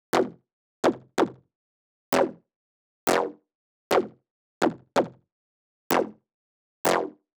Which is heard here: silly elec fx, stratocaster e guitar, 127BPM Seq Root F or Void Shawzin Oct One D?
127BPM Seq Root F